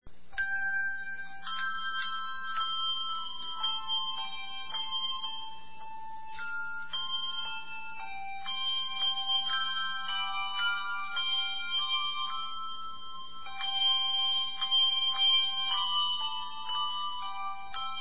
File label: Bell Choir; St Peter LC; For A Thousand Tongues To Sing